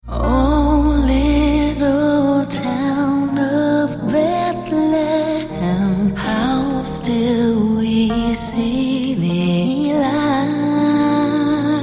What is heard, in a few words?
little drummer